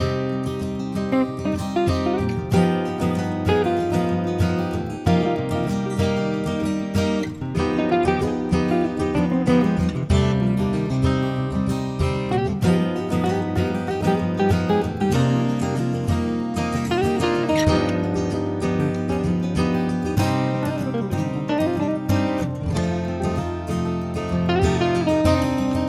Музыка для сна оркестр Расслабиться

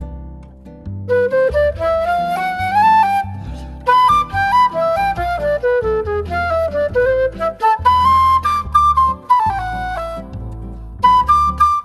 Осенняя музыка

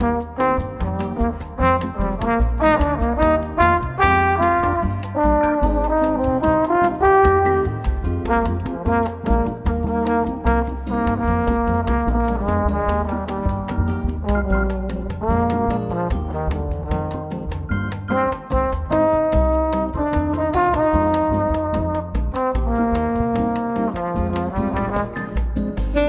Музыка для работы За работой романс

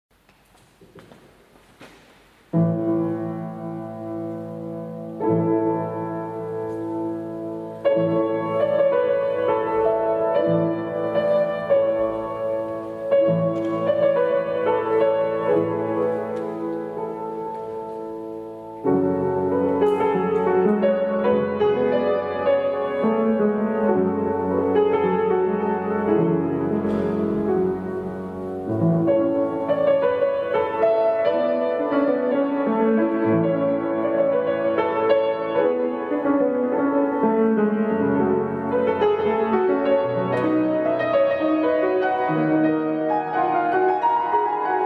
фортепиано, клавир; Дж.ПЕРГОЛЕЗИ.Концерт G-dur(1.Spiritoso)

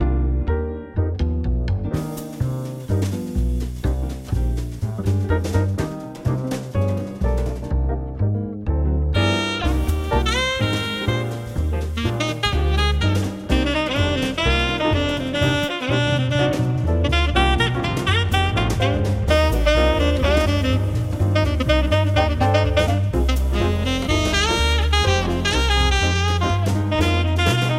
русский Музыка для чтения Настроения

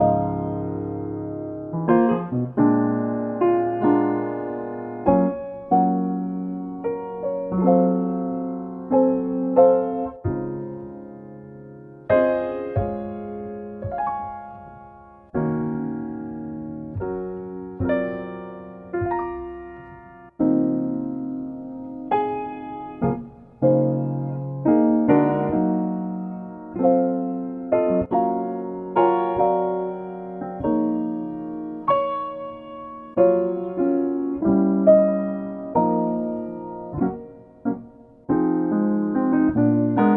роскошный Фортепианная Музыка Спокойствие

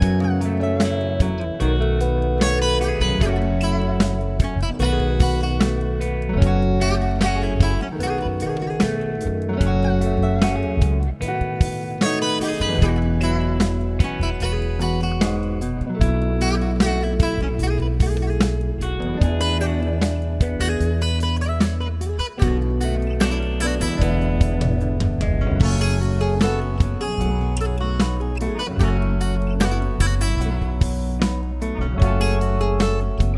Музыка для учебы

